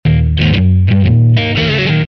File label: Eb4 130 rhythm RnB